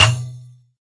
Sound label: Processed Ethn Perc, Doru Malaia Percussion; ETHNIC and WORLD PERCUSSION